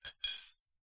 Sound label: industrial wrench spanner metal small set down garage floor